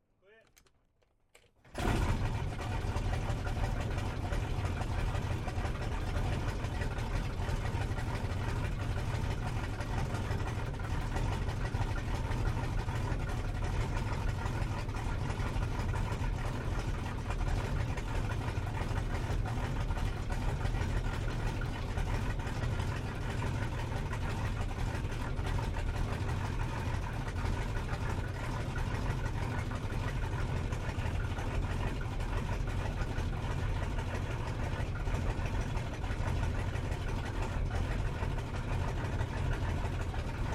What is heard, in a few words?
AIRCRAFT 1916 SOPWITH PUP REPLICA STARTUP IDLE INTERIOR STEREO; Replica of a 1916 Sopwith Pup; Airplane; Vehicles